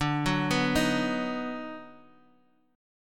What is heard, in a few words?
D sharp Minor Major 7th Sharp 5th D, chord